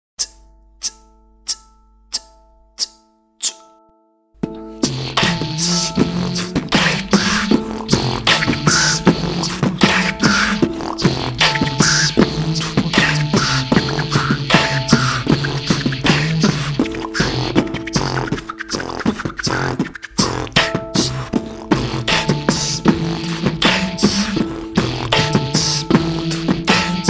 Beat

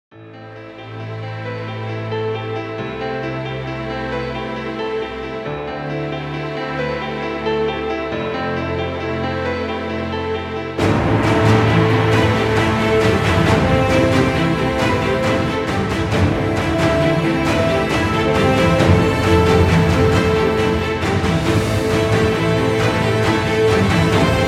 Cinematic Epic Epic Music

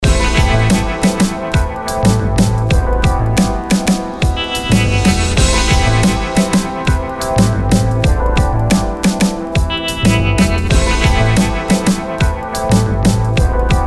Jingle On TV

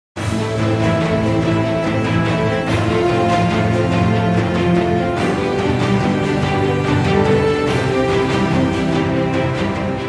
Adventure, Background Music

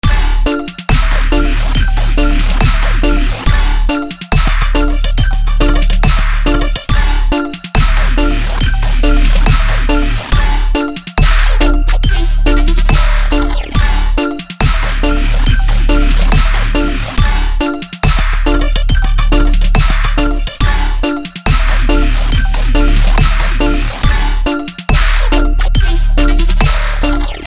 Dubstep

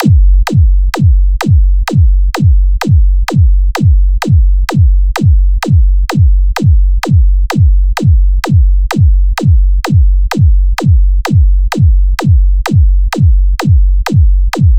Beat 128 bpm

